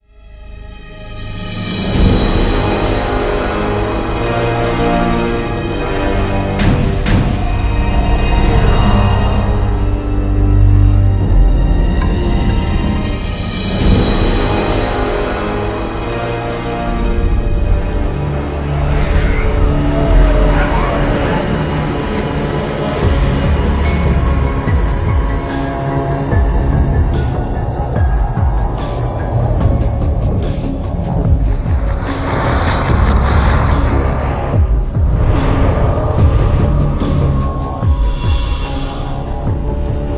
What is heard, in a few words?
tension music